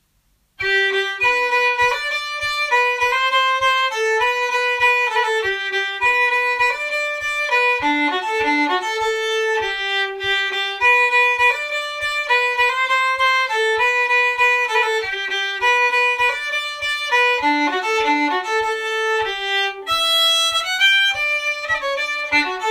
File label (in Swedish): Polka Svanskog; efter Anna Olsson; Allspelslåtar 2019